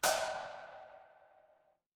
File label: transition braam